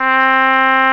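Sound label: English Horn; Instrmnt; Sound Library 2000; Wayzata Technology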